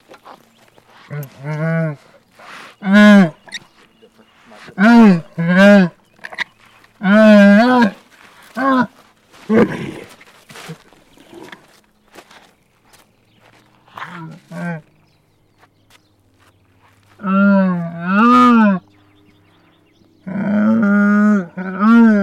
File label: Camel Moans Complain; camels